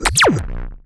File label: blast